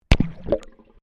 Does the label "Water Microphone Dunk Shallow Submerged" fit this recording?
Yes